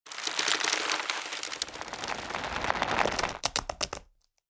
Military rope tightening; Military Equipment

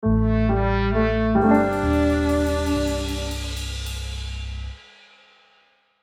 Melancholic scene sad emotional sitcom music

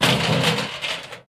Street soccer goal

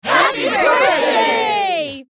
Mixed crowd happy birthday; celebration

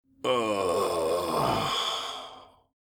Old man exhausted moan

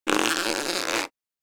Rubber stretch and stress

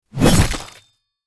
Guillotine blade drop impact hit; medieval

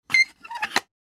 Hamster cage closing

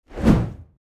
Infographic data chart zoom in

